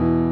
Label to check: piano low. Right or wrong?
Right